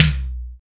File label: Tom Mid